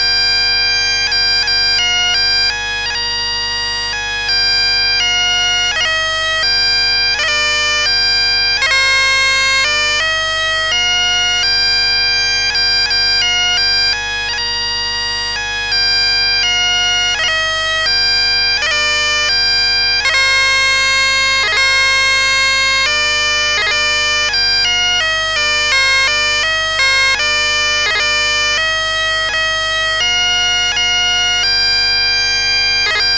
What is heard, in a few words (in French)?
hymne national ukrainien; Chtche ne vmerla Ukraïny